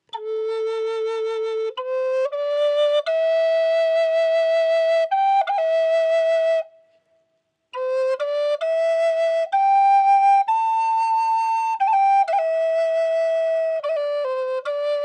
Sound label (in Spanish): tono A, flauta medicina roble; varias tonalidades